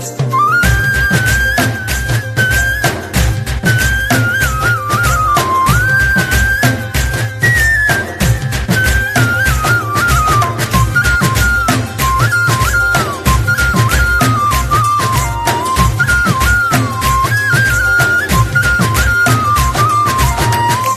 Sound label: flute; Indian; Ethir Neechal